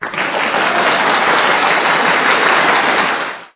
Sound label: go; bubble-shooter